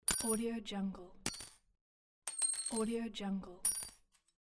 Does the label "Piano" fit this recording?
No